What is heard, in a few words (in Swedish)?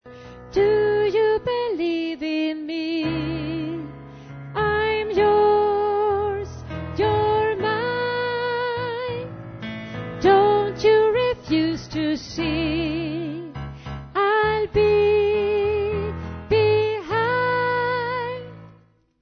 bas